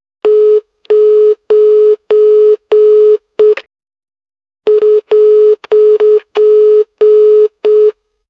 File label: phone number dialed on sm, keypad